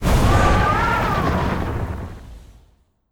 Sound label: Dis Blizzard